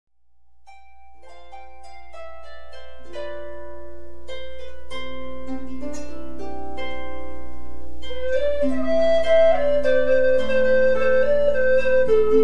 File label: harp and recorder; Shebeg Shemore